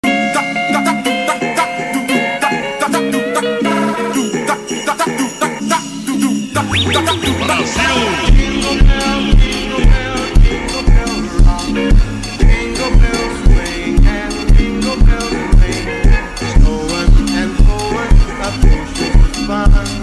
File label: jingle bells funk slowed